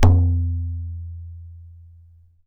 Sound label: PAKHAWAJ, DRUMS OF IND; AKAI S6000 CD-ROM; WorldSounds